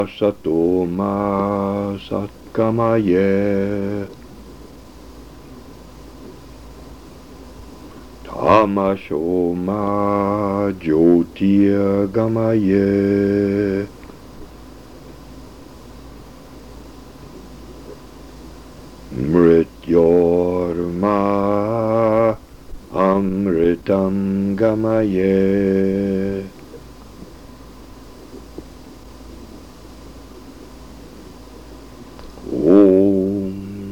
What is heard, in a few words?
Closing Chants